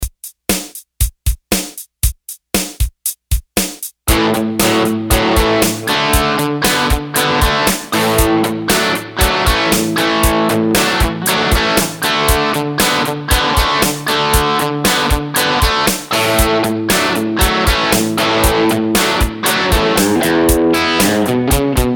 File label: 8 beat ac30 room verb; vox